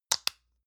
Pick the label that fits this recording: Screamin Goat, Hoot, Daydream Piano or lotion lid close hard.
lotion lid close hard